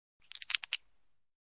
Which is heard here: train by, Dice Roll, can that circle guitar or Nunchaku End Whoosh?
Dice Roll